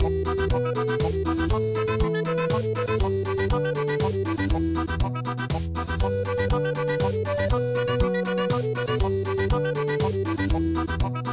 chonan samugori country

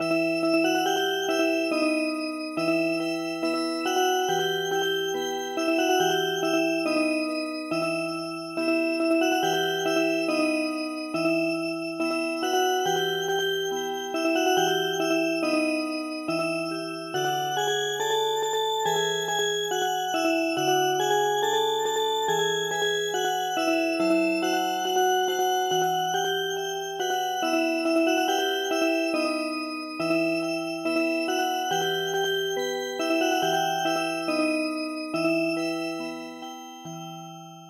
Music Box, traditional

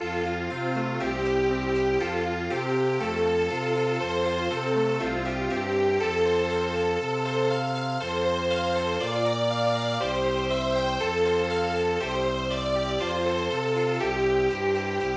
Room Chember, midi; Ningyo

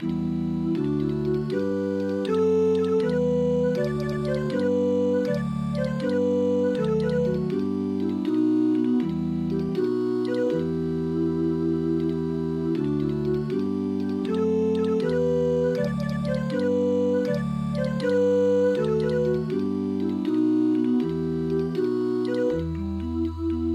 Street Singers; Achtalan Inmojtalanh

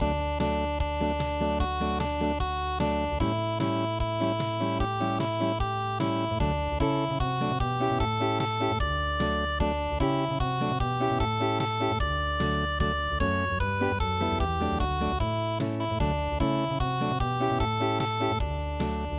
unpluged; Days Of The Months

